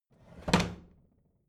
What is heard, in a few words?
bathroom drawer close heavy